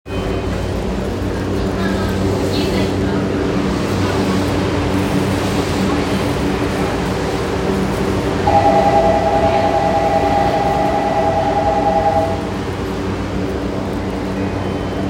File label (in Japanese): 田園都市線用賀北, train sounds; 東急